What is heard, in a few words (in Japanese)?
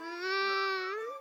"んぅー", 感嘆